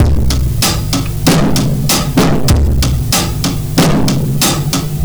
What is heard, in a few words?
R&B Drums